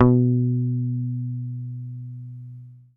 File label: HOFNER SUS B2, Bass; Sampleheads; Will Lee Bass Library